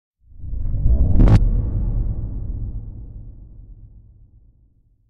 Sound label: Impact Deep Reversed Air Hit; Hollywood Edge; sound designer's toolkit v2